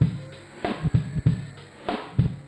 Machine Loop; Yamaha DTXpress-II

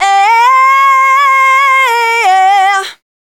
Dance Vocals, FEMALE

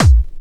kick techno, drums; Techno Trance Essentials

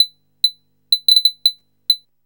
guiro, synth guiros; Zero-G Ambient 1

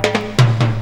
drumbreak, 130

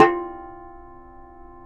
YAYLI TAMBUR F; Spectrasonics; Hans Zimmer Guitars VOL-2

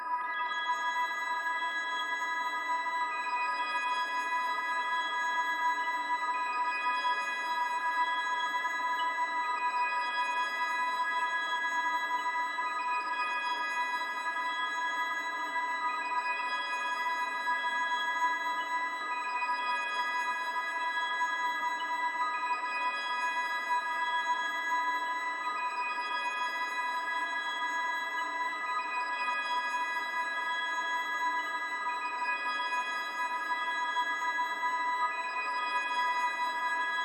sparkly bleepy bloopy sound; pad

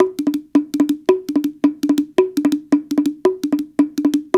110 Hawaiian, World Dance Beats; Spectrasonics - Supreme Beats

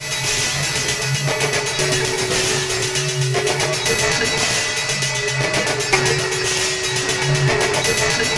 CYBER DRM; Best Service Advanced Media Trax Building Blocks; WAR GAMES

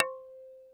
C4, Yamaha VP-1; Music Store; 90's Sample CDs; MIYABI

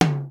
Yamaha RX-5, drums perc; Rubber Chicken Software Sample Bank

